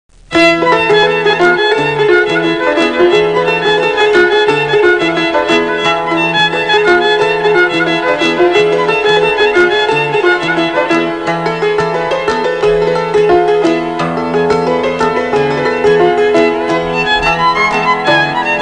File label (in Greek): Βιολί Σαντούρι